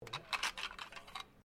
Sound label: PISTOL TANFOGLIO 38 SUPER MAGAZINE UNLOAD; Handguns, non military; Pistols (Sound Effects:Guns)